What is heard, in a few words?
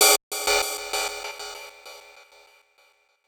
Gated Cymbal; musicradar